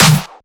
SnareClap; FLPRO

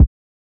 Kick

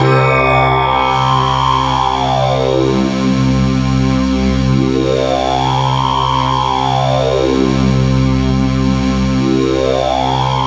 Sitar Drone

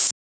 Open Hat; FLPRO

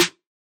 Snare

